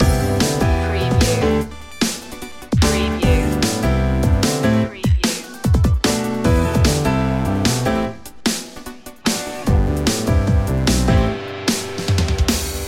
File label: piano pop loop